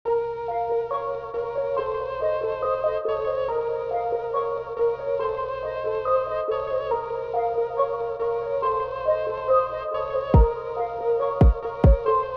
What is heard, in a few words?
Instrumental BEAT